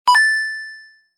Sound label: groan; maze python; 打boss动画